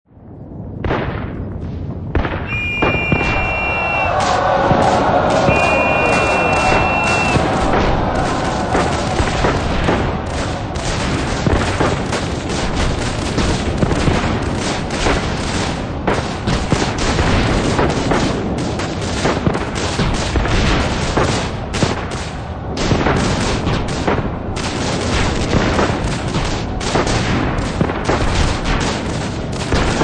Warfare World War One Battlefield Whistle Charge Gunshots Explosions; WWI; Explosion Sound Effects